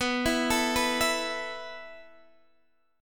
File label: B 7th Suspended 4th B down Guitar Standard; arpeggiated; capo 3